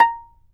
fiddle pizz A#5 L; Sampleheads; Whole Lotta Country 1 & 2

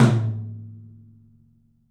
OPEN TOM; 3D Sound; 3D Drums; 12 TOM

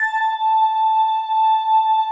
BELLS AND; Synclavier Sampler Library; KEYS AND GUITARS

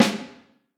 Vintage Snare Drum

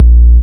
JV80, Roland JV80, Drums

